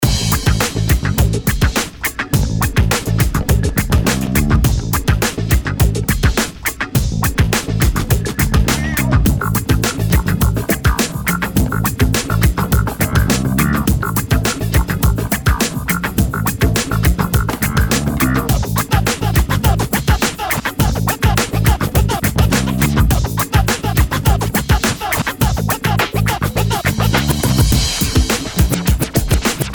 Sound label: Soccer Funk